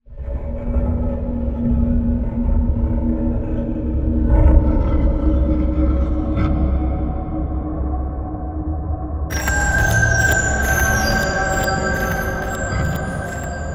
horror creature drone

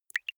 single drops falling

